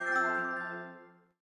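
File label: Message Notification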